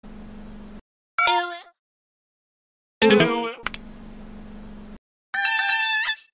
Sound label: Uwu but phones Samsung